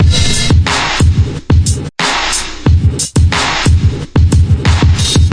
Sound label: 90 bpm, dope dangerous gate drums